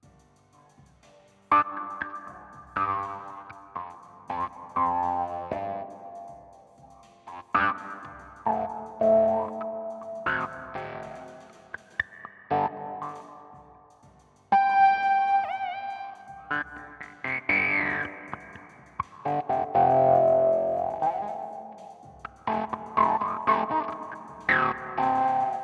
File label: guit fuzz R